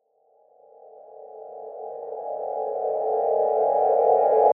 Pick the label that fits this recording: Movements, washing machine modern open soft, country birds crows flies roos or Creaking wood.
Movements